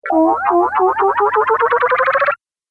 Arcade Game Long Rising Bleeps; Video Game Sound Effects; Retro Inspired Sound Effects - Think Nintendo, Atari, C64

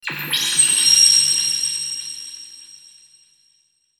Logo Resonance Blurp Reverb; Sonic Logo; Blastwave

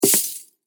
Footsteps Cowboy Boot Spurs Single Right Hard

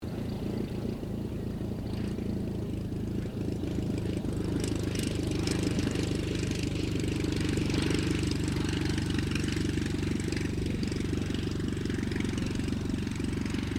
AIRCRAFT RYAN STM-S2 LANDING; 1939 Ryan STM; Airplanes; Vehicles

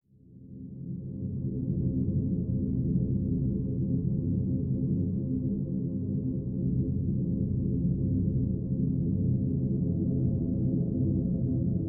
Atmos Fantasy Drone Moving; Sound Design; Sci-Fi; Big Room Sound

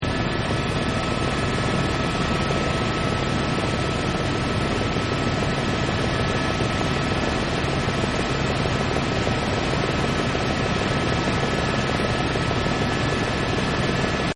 Helicoptor Int; Helicopter Sound Effects; Vehicles

